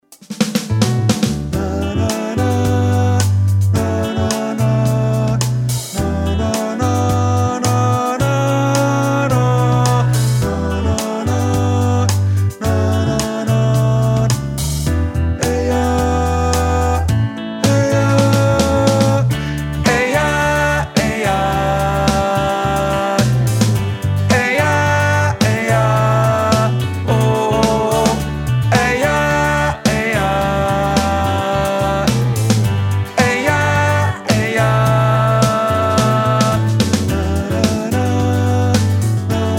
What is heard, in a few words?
Tenor